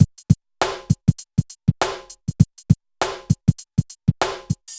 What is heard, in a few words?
BPM100 SYN; AKAI S-Series CD-ROM Sound Library VOL-4